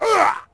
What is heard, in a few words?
Player Grunt